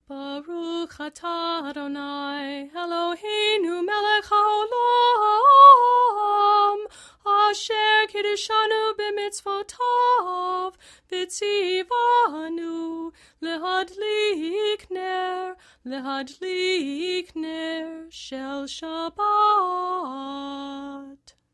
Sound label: Candle Lighting Chanted